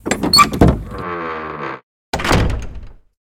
church door; DeadFright

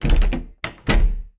door; firefight

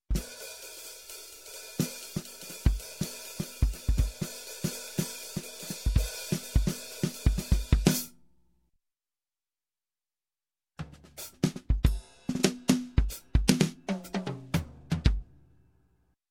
Drums jazz Tag, Music, Percussion; Sound Ideas 2000 Series

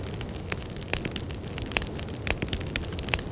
Fire Campfire Loop; Destruction

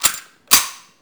Gun Handling Weapon, Weapons Foley; SFX Kit

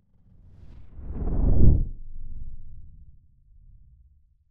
Whoosh Ultra Low Rumble, Drone - Faster; Hollywood Edge; sound designer's toolkit v2

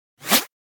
Whoosh Fast Sci-Fi Small Air; Hollywood Edge; sound designer's toolkit v2

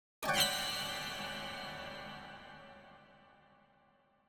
Harmonic Plucks Sharp Horror Chord; Hollywood Edge; sound designer's toolkit v2

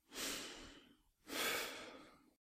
Breathe - Half breathless; Eden; RadwinIsland

